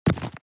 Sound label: stone big